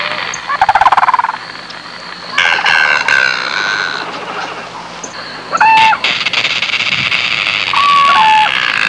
Various everglade bird sounds

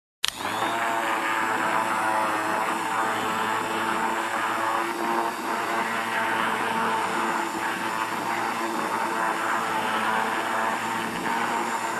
Hand Blender

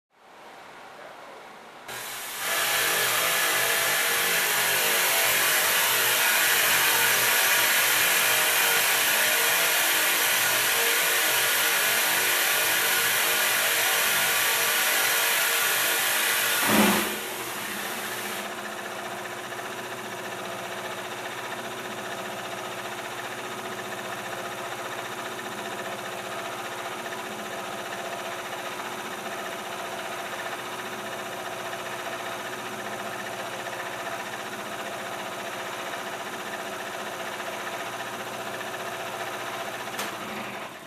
Industrial Cutter machine running

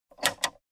Button push and snapback

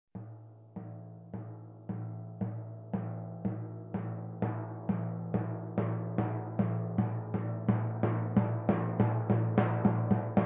Longest Timpani Crescendo

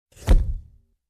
Wet Plate Camera Shutter

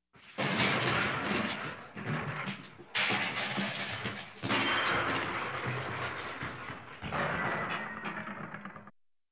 Explosion with debris flying

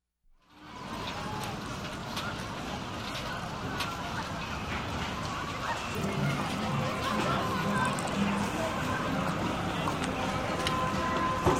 Busy Street

